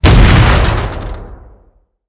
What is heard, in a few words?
Drone Explosion; Decagram Ex; blue archive